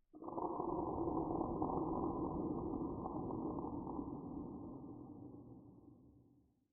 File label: Ambient; Barotrauma; Subsurface